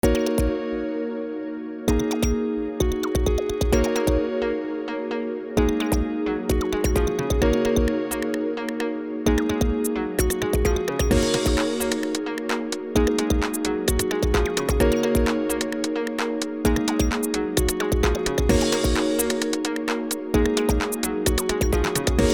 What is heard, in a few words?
drum